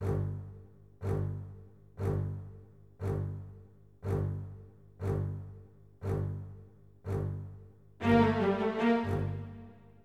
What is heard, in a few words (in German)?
Dramatisch; aschenputtel